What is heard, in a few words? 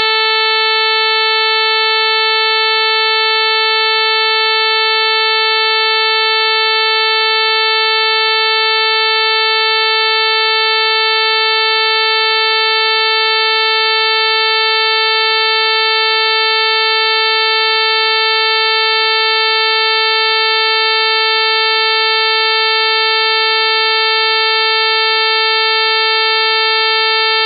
inharmonic series